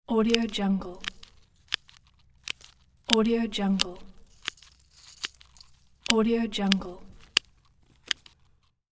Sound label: pruning plant; domestic sounds